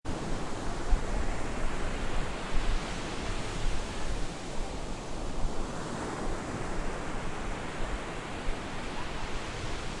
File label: beach; cyprus